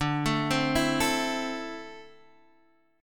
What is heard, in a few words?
D 7th Suspended 2nd down Guitar Half Step Down